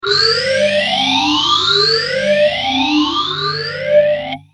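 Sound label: guitar boss ph3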